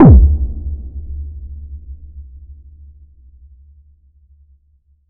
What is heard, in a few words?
deep chunky reverb satisf